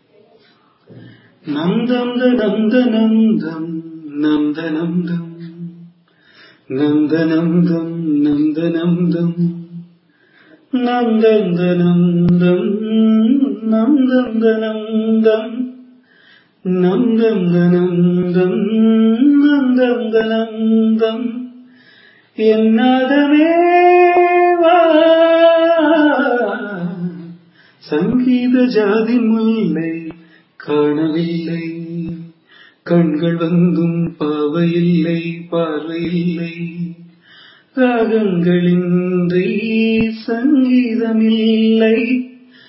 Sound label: raga revati cine; carnatic music